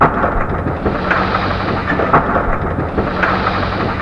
MACHINES; ASSORTED MACH; FX

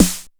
SNARE, Skanky Perc; E-MU Formula 4000 Series Vol. 1; Hip Hop Nation

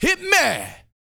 VOX, Vocal, R&B Dance, HIP-HOP

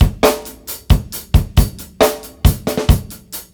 DRUMLOOP, Ragga & Dub Reggae

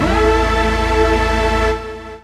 chord A; Techno Trance Essentials; CHORDS

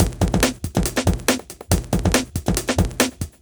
Break Beats, 140 BPM

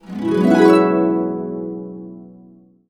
E, Harps; USB Soundscan vol.62; AKAI

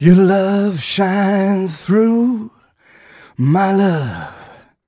130 mel g, Vocals

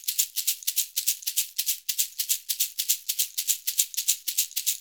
SHK, Shaker; Univers Sons; Basicussions

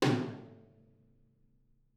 R B Rack Tom A Room; Toms; Sonic Foundry (Sony Creative Software) - Drums from the Big Room The Mixes; R&B One Shots

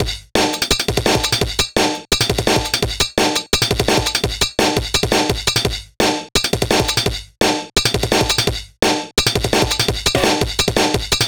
Jungle Bell Beat; Drum n Bass; Loops; Beats and Percussion; KJ Sawka; Drum n Bass & Beyond

